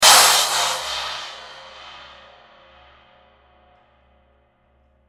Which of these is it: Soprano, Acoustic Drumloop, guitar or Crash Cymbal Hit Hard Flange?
Crash Cymbal Hit Hard Flange